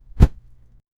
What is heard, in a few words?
air movement from hit